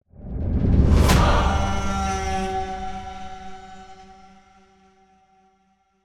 Unlock Dark Level; Towaga; Android

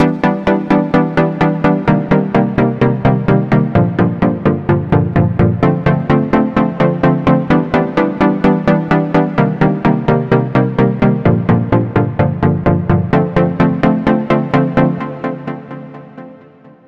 Synth Main

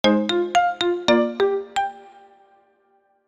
Jingle; Peace Pieces

